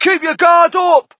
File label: crowd, shout; Mike Tyson Heavyweight Boxing